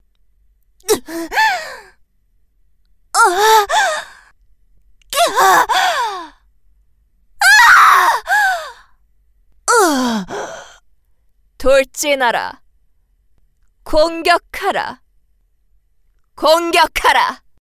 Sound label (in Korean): 호흡 및 기합